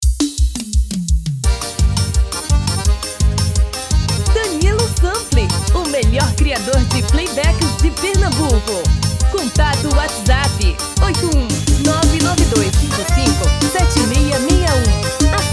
FREVO tom feminino

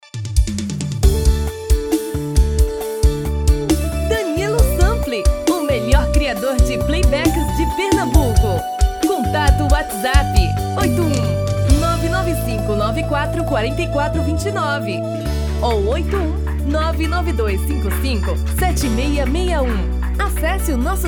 tom feminino

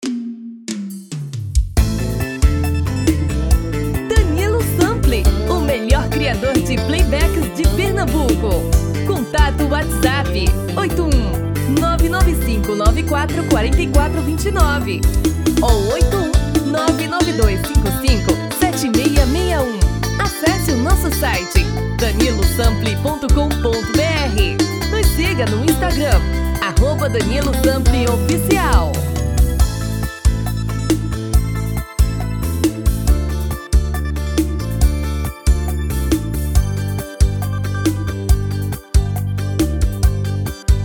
POTPOURI

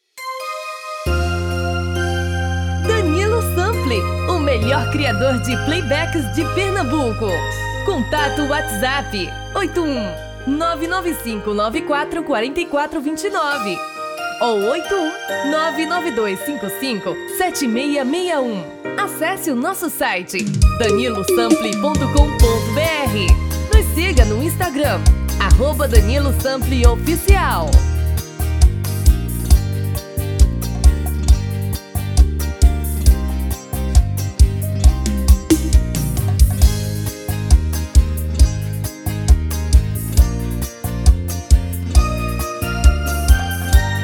Arrocha

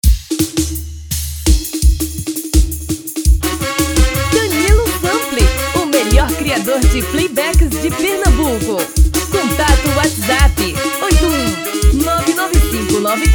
Frevo

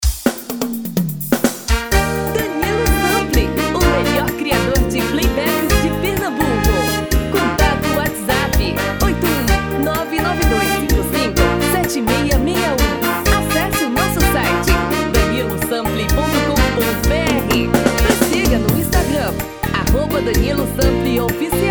SERESTA

